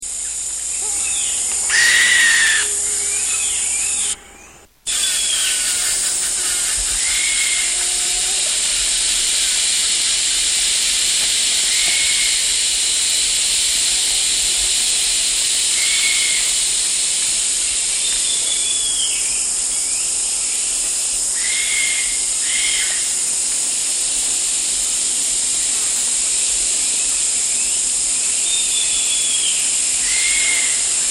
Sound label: white throated woodpecker